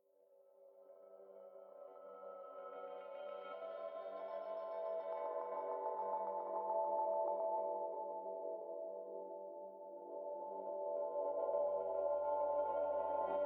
Savannah Music

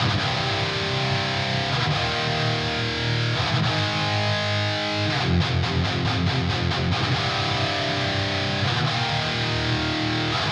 Drums and Jingles Exploracion GUITARS

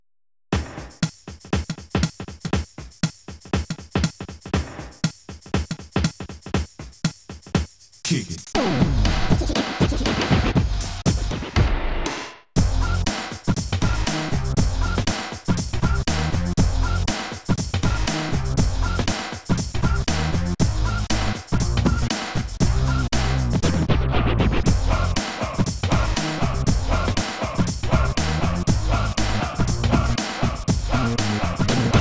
Snes Concert Song; Blizzard Arcade Collection; PS4; Xbox Series X/S

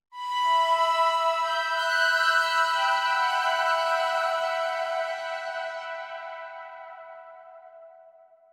Synth Emin

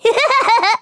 Pig Giggle; Dora the Explorer Journey to the Purple Planet; PiratePig

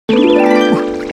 Get Item; Piglets Big Game; GC; PS2

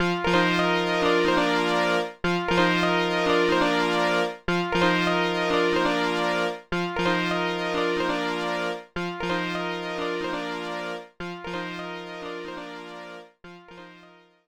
Win; hot pixel; psp

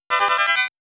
Extend Sound; Galaga

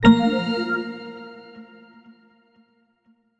Jingles PING END; Semblance

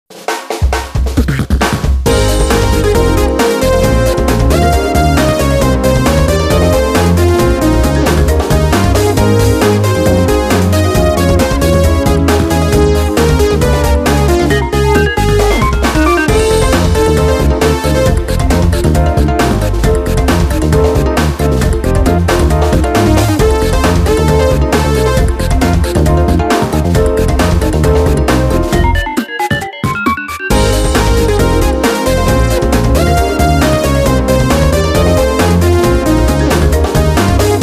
Music Menu event

